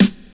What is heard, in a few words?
Raw Snare Single Hit G Key, steel snare drum sample tuned to the G note